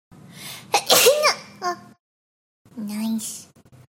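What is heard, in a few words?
sneeze notification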